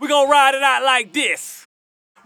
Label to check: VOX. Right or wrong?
Right